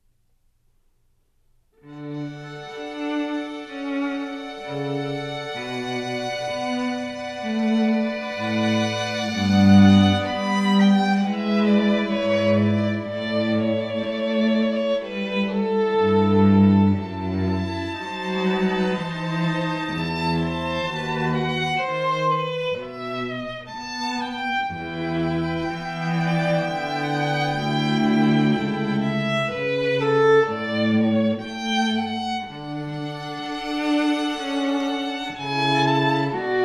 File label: String Quartet, Air; Seattle